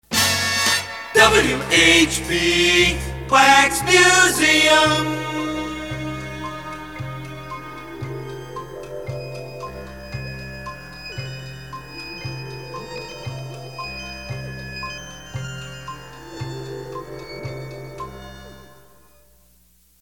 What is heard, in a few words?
Time Tunnel; WHB; PAMS